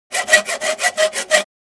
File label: Handsaw cutting iron